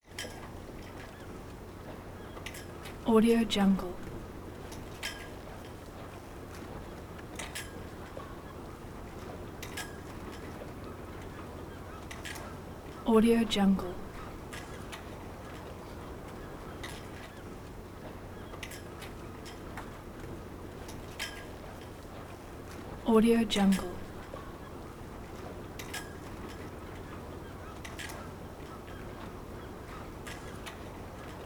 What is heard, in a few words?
marina ambience dock ambience water lap